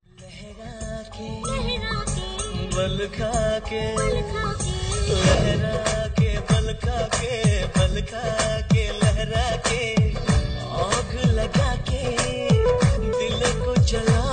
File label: status ringtone, bollywood ringtones